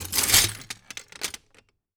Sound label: Glass scrape